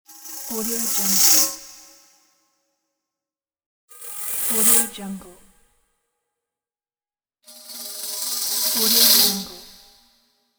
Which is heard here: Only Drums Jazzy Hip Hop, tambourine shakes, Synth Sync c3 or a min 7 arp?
tambourine shakes